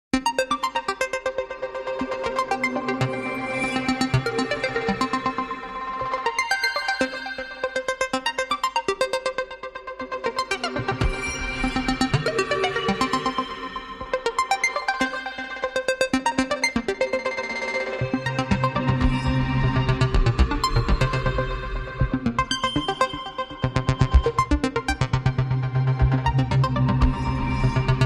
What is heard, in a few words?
High Synths